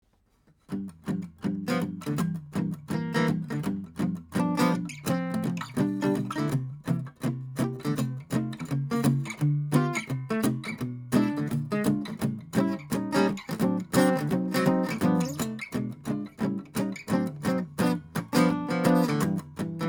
Jazz Blues; AKG C451b

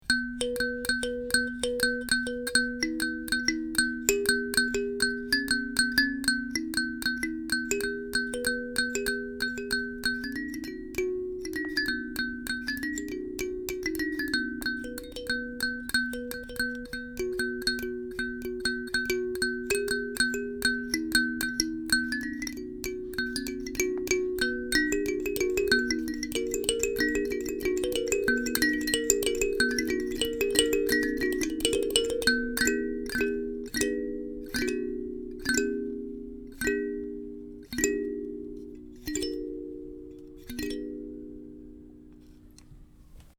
Perc Kalimba; Blue Dragonfly

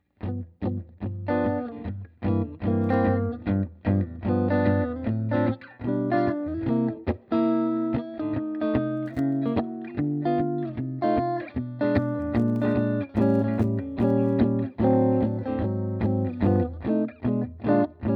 jazz; PRS 22 Soapbar

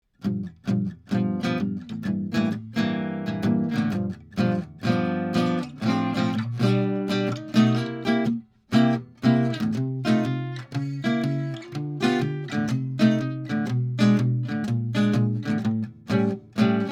Jazz; Sennheiser MD421; Warm Audio WA12 preamp; Metric Halo ULN8 interface; 1933 GIBSON L10 ARCHTOP

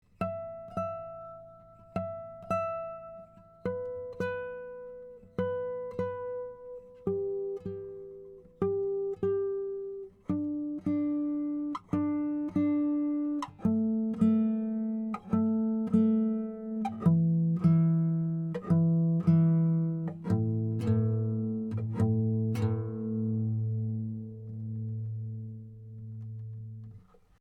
Intonation

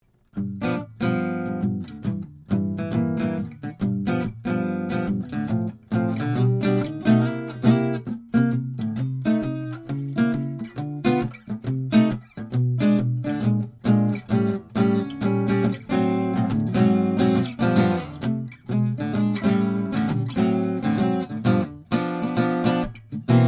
Jazz; 1933 GIBSON L10 ARCHTOP; WA12 into a Metric Halo ULN8 converter. No EQ, compression, effects.; Blue Woodpecker

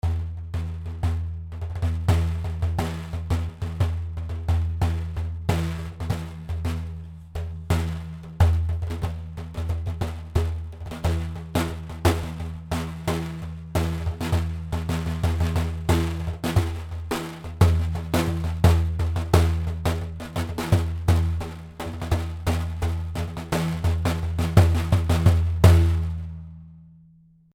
Perc African Drum; Blue Dragonfly

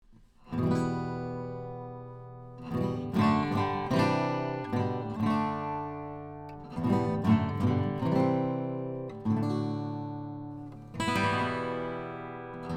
Chord Improv; U47

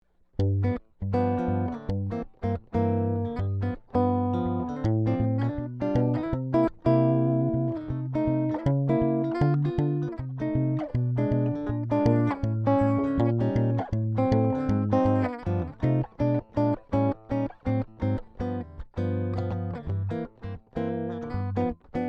Jazz, Gibson LP Studio

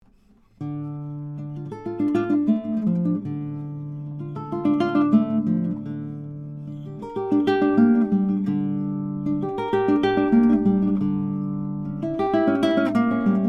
Prelude, Neumann U87Ai

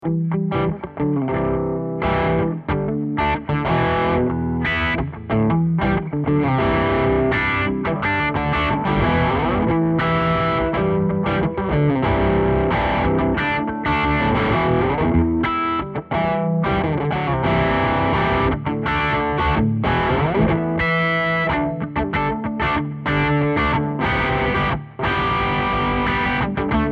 Harmonic; EpiUSA; Harmonics / (Original in Em)

